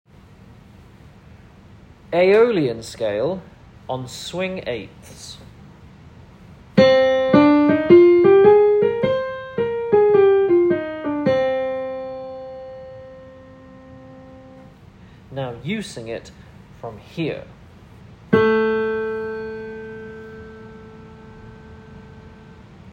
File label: Aeolian